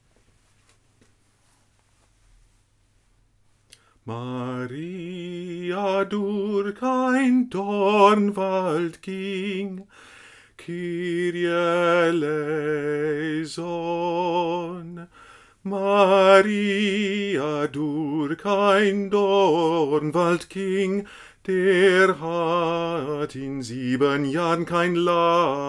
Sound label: Bass